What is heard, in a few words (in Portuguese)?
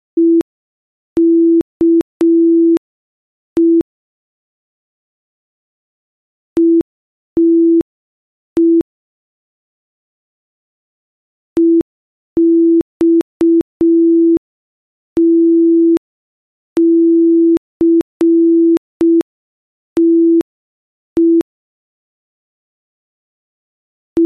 ritmo velocidade